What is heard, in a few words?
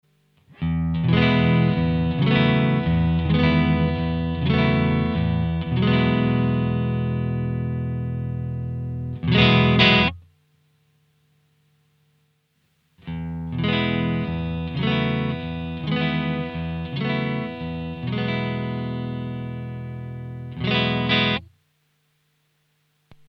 Amp USA Twin; Simlpifier